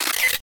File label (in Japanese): カメラのシャッター